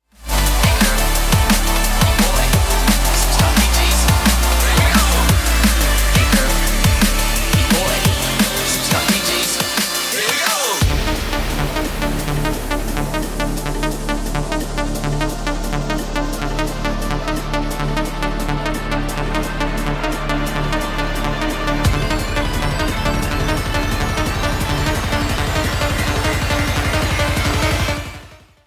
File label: drum sound bassline